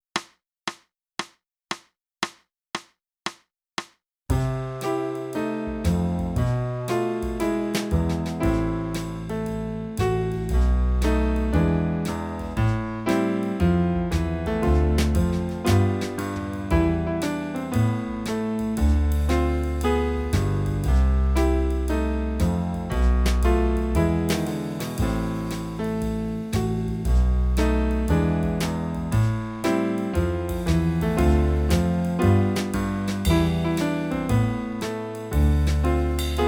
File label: flute; (Morgan) - Boosey Woodwind Method: Flute (Book 2)